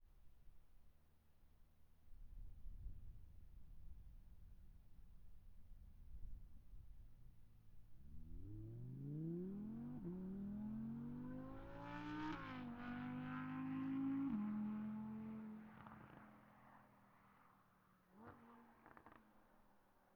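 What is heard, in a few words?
Audi, R8, Exterior, Fast, Various, Distant; Audi R8 2017; Pole Position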